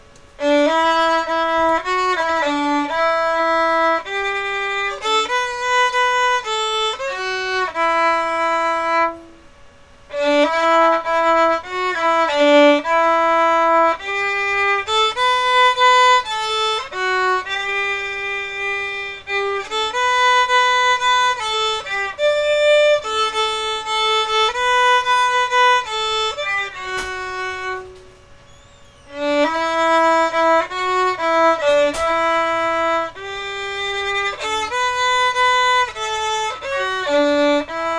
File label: candles acoustic; practice takes